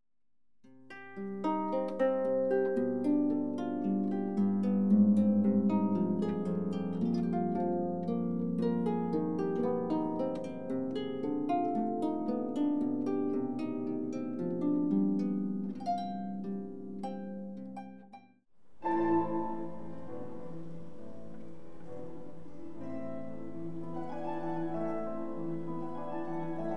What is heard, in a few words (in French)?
musique